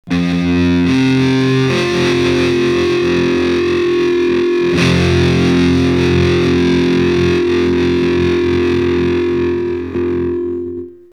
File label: f5, guitar